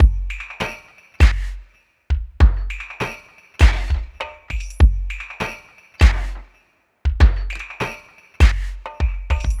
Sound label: 100 full drums, ambient piano loops; Low Tide; C and Dm Night Mood